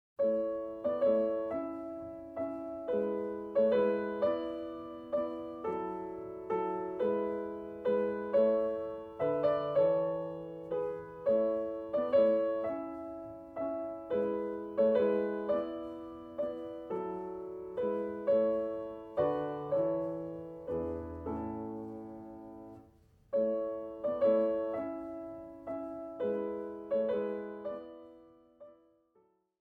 Piano Sonata No. 11 in A Major, K. 331 - Ia. Theme